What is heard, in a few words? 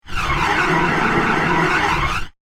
Reverb Whoosh Abrupt End; whoosh sound effect; swish, sweep and swoop